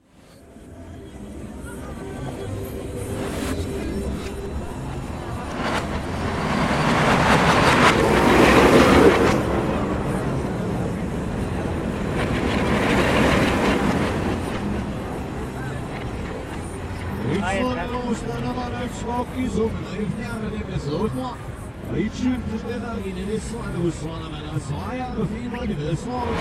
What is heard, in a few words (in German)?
Achterbahn